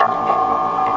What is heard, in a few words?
phasing noise loop; LOOPING AMBIENCE